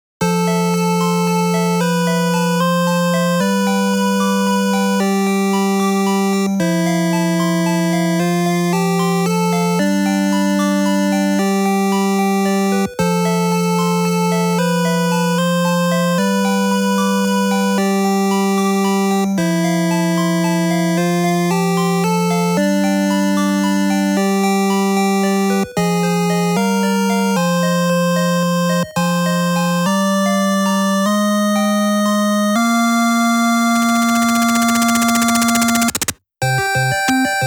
Victory!

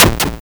nailgun

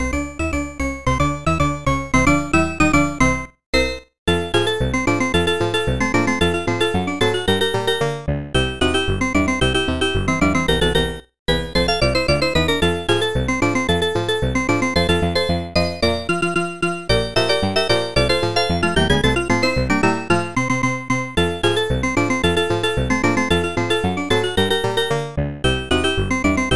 Toy Pop Game BGM

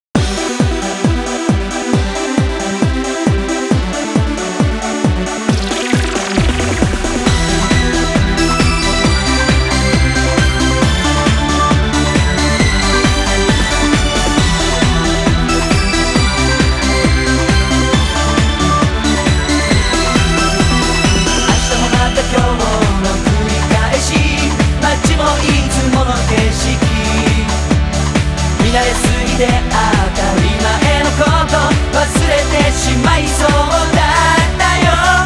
Puzzle; Dance Dance Revolution Ultimate Dance Collection Pop

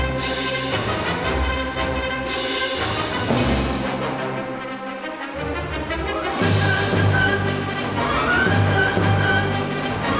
Battle Loop

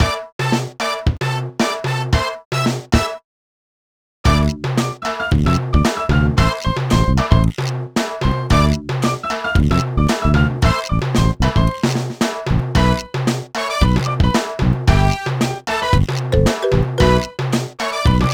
Mus Gameplay Click; Go, Diego, Go! Great Dinosaur Rescue; NDS

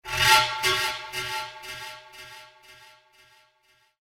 panflute; crysis warhead

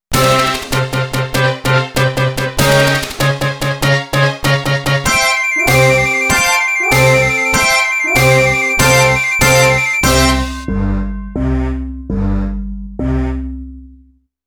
Spaceship Completion!; Pikmin Worlds

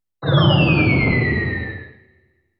Monster Skill Invoke Sound; dot hack

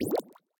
Shield On; boomerang fu

